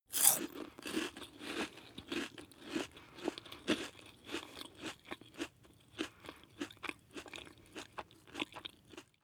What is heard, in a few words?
chew biscuit one piece